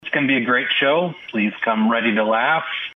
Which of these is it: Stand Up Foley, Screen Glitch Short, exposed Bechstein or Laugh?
Laugh